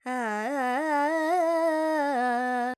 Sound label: Phrygian, Vocals, Carnatic Female, Alaps, Dry; 70 G; Sample Banks